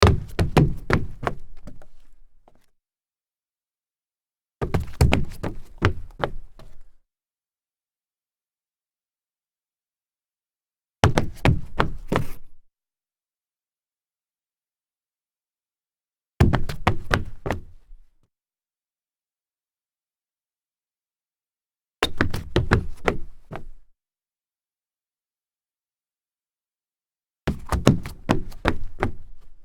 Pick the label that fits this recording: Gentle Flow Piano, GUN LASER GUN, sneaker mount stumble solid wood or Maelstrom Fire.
sneaker mount stumble solid wood